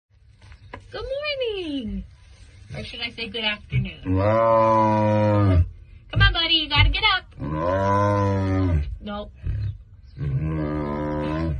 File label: tiger meow ringtone